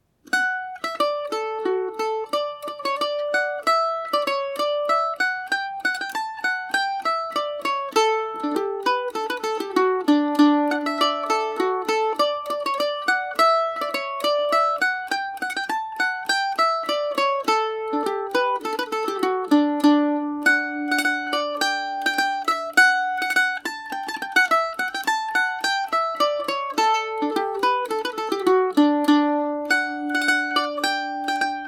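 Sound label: D major paris swing, irish mandolin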